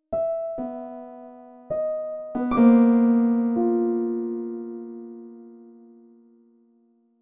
Piano

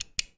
Flashlight click

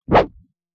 Fast Whoosh

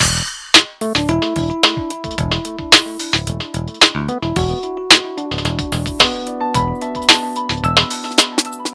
Ambient Loop

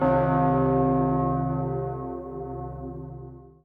Bell

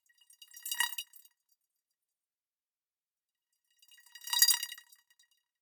Drippy

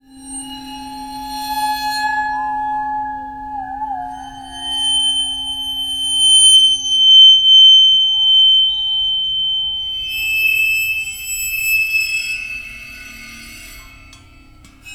Waterphone

